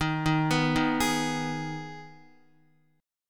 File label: D sharp Suspended 4th Sharp 5th, Guitar; arpeggiated; JGuitar